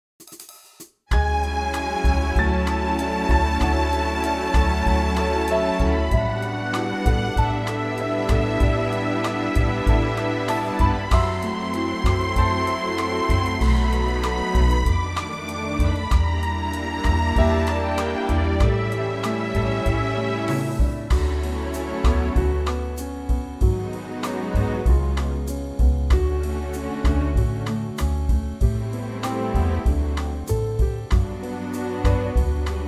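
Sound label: D orchestral; female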